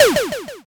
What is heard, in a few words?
shot